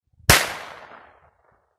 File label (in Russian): signalnyj